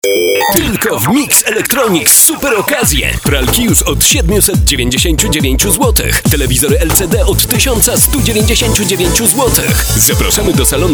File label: Mix Electronics